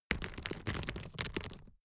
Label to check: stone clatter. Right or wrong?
Right